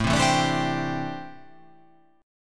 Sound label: A Minor 11th A, Chord